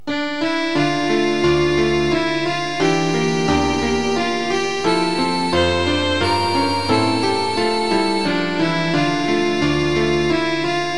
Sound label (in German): violine u klavier